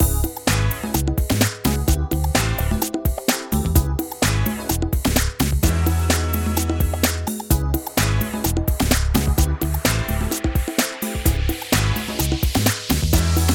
Click Here to Begin, music beds, affects and jingles